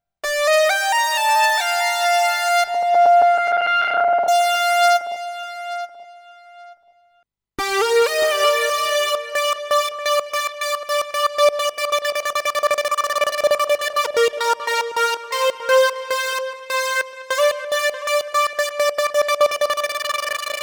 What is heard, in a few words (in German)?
Roland VR 730 Lead mit BitCrasher und Slicer